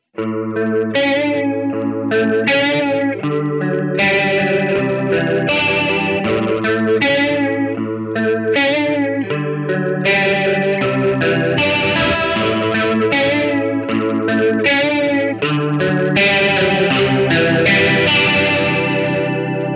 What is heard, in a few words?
Roto Mod